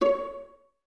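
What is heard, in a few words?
Violas C#5 pizzicato, String Ensembles; Miroslav Vitous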